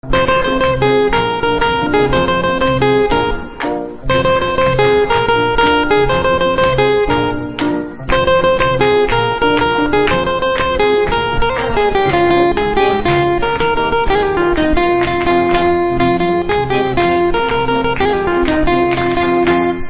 Guitar Instrumental Ringtone

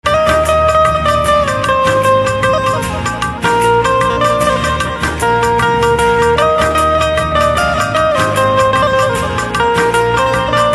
BANJO Ringtone

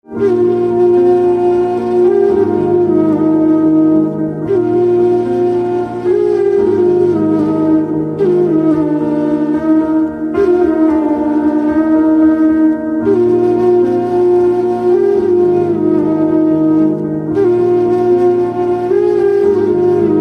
Flute Instrumental Ringtone

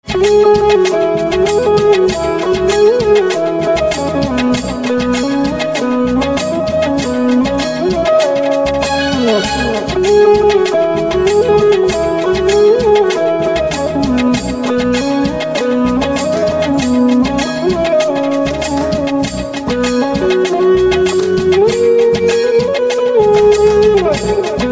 Guitar Ringtone; south indian instrumental ringtone

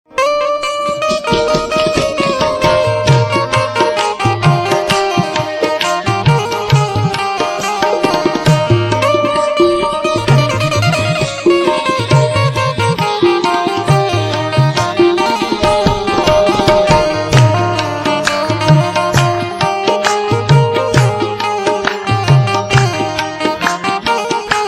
Banjo, devotional instrumental ringtone

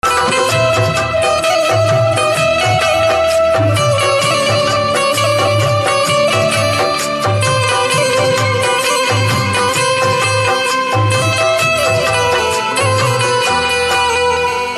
Banjo Instrumental Ringtone

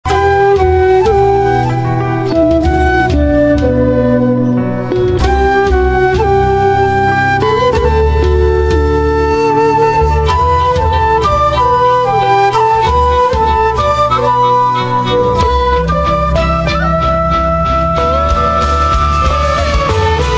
Flute Instrumental Ringtone; south indian instrumental ringtone

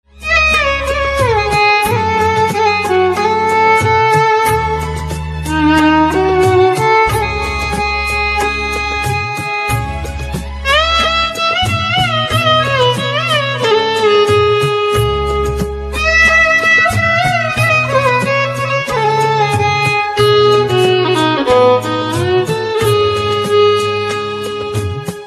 Violin Instrumental Ringtone

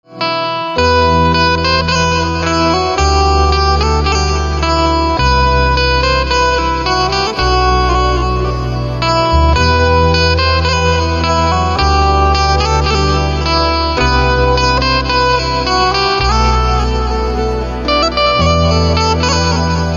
Banjo Instrumental Ringtone

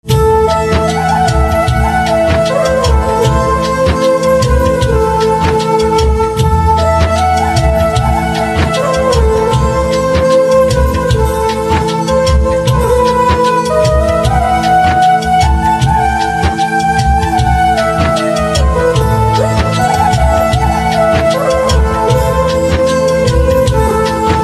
Flute Instrumenal Ringtone; Hero Movie

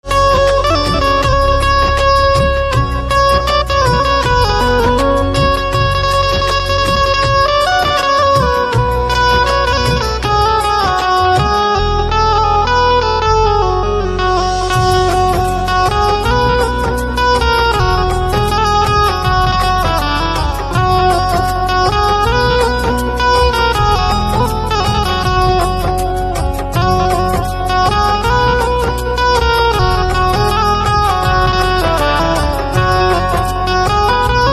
Banjo, devotional instrumental ringtone

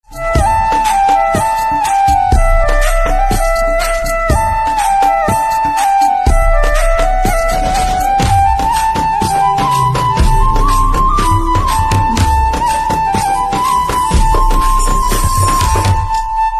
Organ Instrumental Ringtone

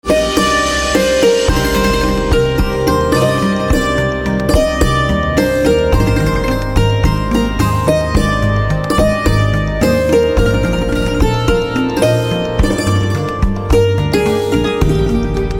Santoor Instrumental Ringtone

